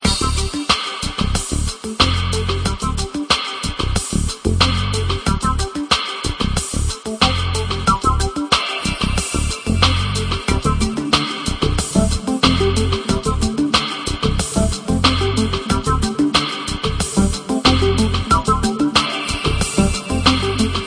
tootstronomy bg

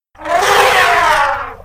elephant bellow

